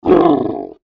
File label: Monster Bear Groan Grunt; Creature; Horror; Animal; Monster Sound Effects